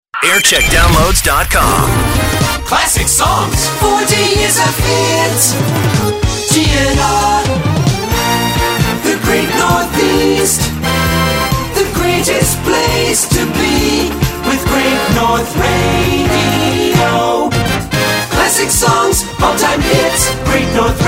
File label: jingles